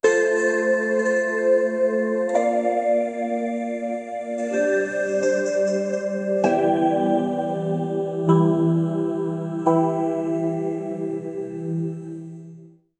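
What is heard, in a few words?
lo-fi starting for podcast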